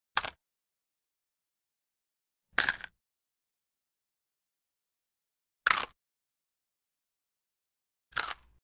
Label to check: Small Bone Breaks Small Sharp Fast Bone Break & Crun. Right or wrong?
Right